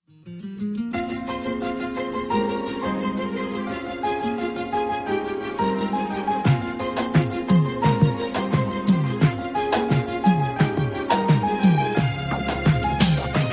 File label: piano